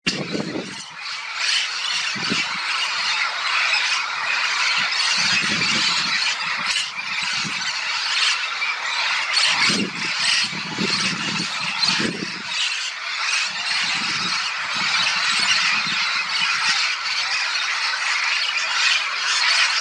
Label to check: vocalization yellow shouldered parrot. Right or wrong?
Right